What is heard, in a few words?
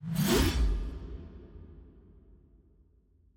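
guillotine whoosh; ambience room torture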